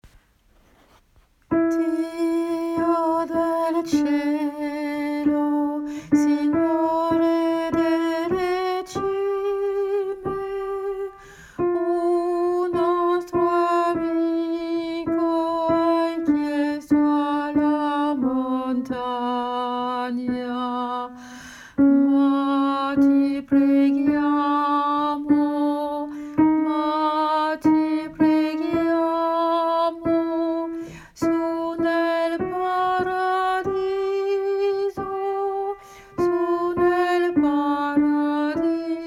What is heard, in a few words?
Alto